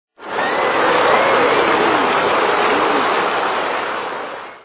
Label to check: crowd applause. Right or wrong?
Right